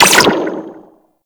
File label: Sentinel Laser Rifle Fire; Warframe